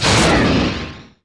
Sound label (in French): impact bouclier